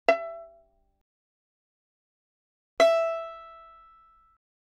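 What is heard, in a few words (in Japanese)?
ヴァイオリン, 擦弦; E5押弦Pizz; 弦鳴楽器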